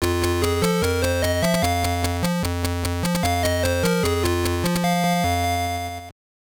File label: Race Start; super off road; gb